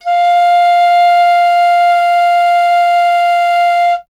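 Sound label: flute piccolo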